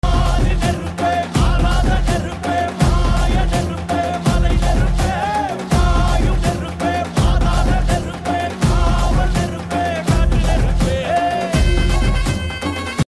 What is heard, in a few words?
Tamil; kanguva; surya